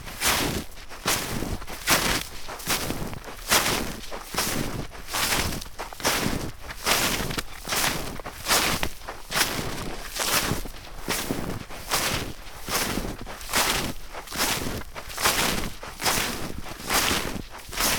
Walking In Snow With Snowshoes

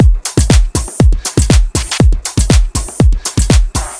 Beat

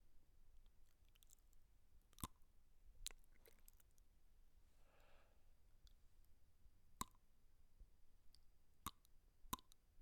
Teeth Gnashing And Grinding

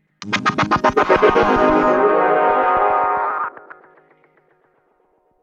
Resonating Shot

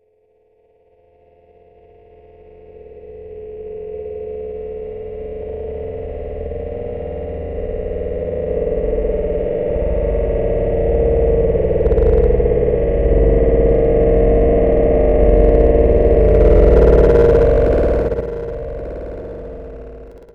Spaceship Passing

